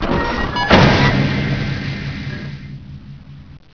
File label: close; quake2; kitsune